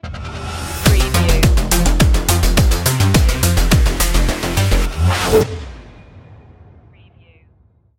funky stinger

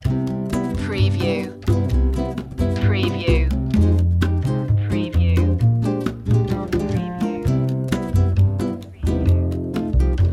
bossanova

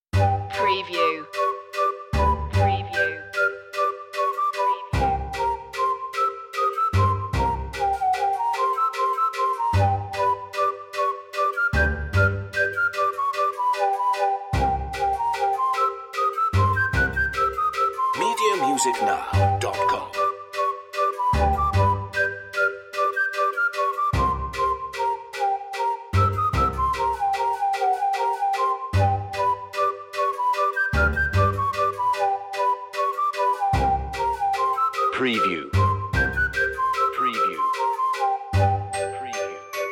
no percussion

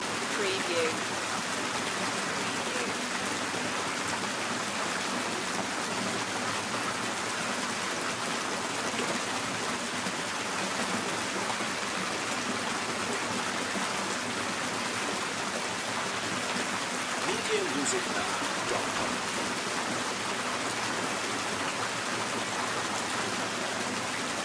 millwheel water splashing loop

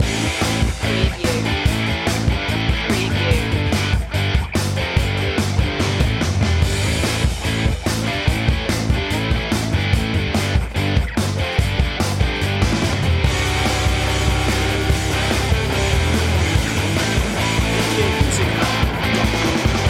rock steady

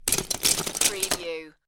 Lego, drop several pieces into lego box

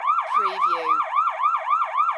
Police Siren Loop

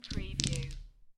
Dice Throw Five Dice On Felt

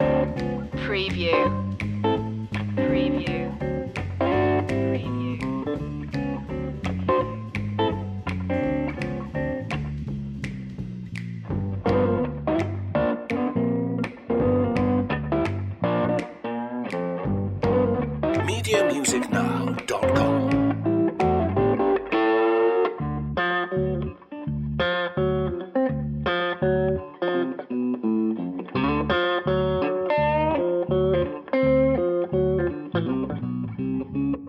fixin to score loop

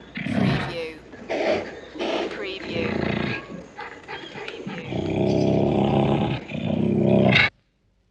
Leopard Ocelot Growl Hiss

